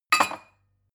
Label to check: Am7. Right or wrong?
Wrong